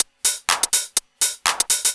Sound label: 124bpm, House Perc Loops